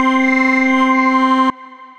Organ

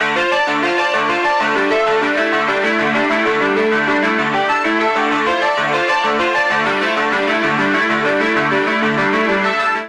Bounce D Dorian 97 bpm; Accompaniment; Tonal; MIDI Clips; Sapphire; Drive and Glow; Ableton; Factory Packs

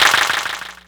Clapbox Pitch15; Ableton Foundation FreePacks

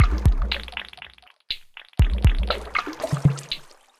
Sparkle Kit, drum racks; Ableton; Factory Packs; Lost and Found

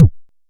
analog quick, Kickbank

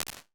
Vocal Doubler; Ambience and Small Rooms; Ableton; Convolution Reverb

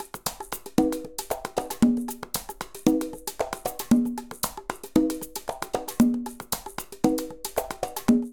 Afro Jazz-115bpm, Latin Percussion; Ableton; Factory Packs

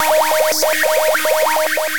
WaterWorks; Stray Cats Collection by Max for Cats; MSE Presets

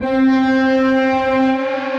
Abrasive Brass; Instruments; Bold Brass; Ableton; Factory Packs; Mood Reel

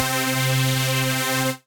Buzzsaw, Synth Lead

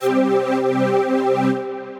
Otherworld Strings, Synth Pad

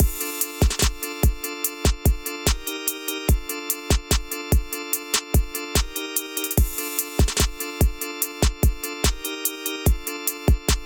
Watcher Kit groove 73 bpm; MIDI Clips; Ableton; Factory Packs; Beat Tools

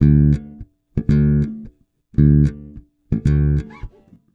110 Pop Basic Bass D, E-Bass; Pop Rock

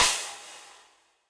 Crash Warm And Low, Cymbal; Drums; Drum Hits; Standard Hits; Ableton; Factory Packs; Session Drums Club

